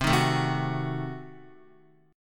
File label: C Diminished 7th C, Guitar 4F,4A,5C,5G,5C,6E; strummed; JGuitar